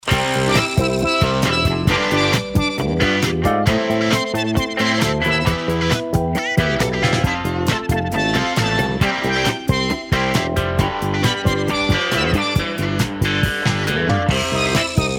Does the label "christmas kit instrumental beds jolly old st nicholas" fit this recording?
Yes